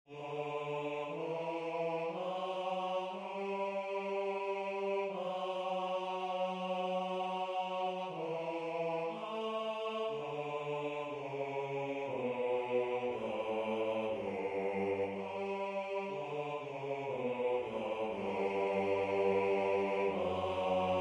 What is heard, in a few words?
OBOE voce